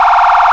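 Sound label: emergency vehicle phaser, sirens; federal sig ms4000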